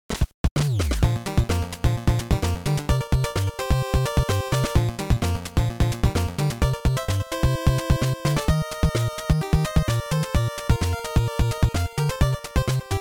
Level Theme; Teenage Mutant Ninja Turtles; NES soundtrack 2024

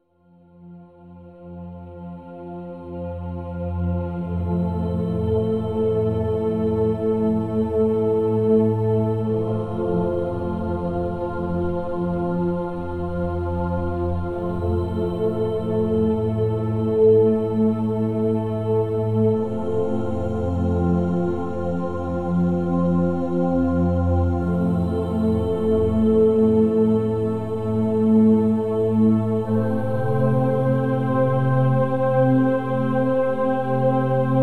Night Explore

